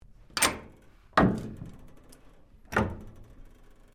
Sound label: DOOR WATERPROOF SEAL, Sounds onboard HMAS Castlemaine; Boats; Vehicles